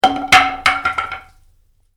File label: Baseball Bat: Dropped Onto Concrete; Baseball (Sound Effects:Sports); Stuart Duffield